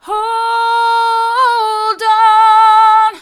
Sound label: hold on hard, vocal